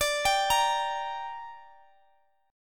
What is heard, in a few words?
D Suspended 4th Sharp 5th D, down, Guitar DADGAD